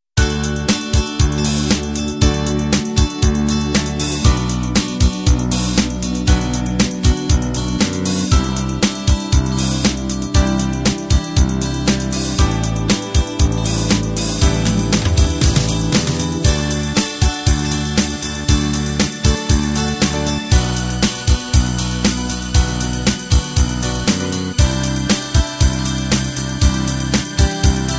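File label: pop 16 beat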